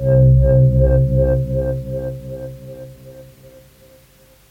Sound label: Synths One Shots Tape Synth